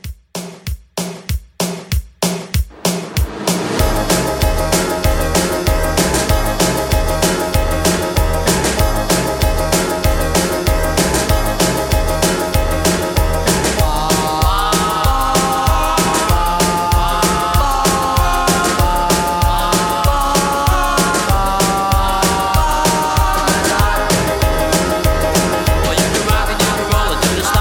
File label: 50s Rock N Roll Re Drum 192